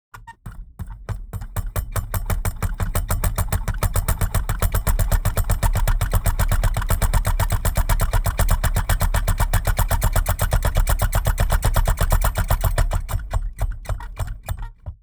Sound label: Bench grinder rattling; Industrial Machinery; gfxsounds